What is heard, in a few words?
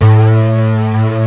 DEEP